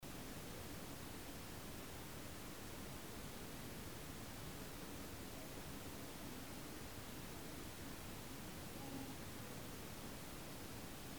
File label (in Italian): Mugugno femminile